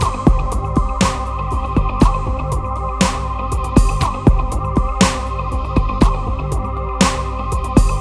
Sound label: Darkwave, Processed Percussion